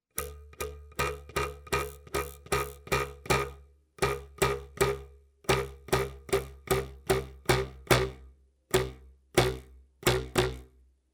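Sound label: ruler sound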